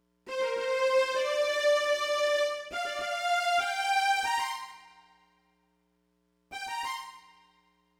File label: Smooth Groove Strings, 70s Analog Funk